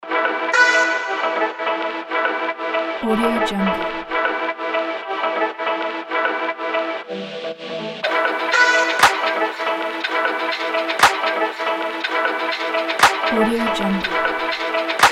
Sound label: abstract trap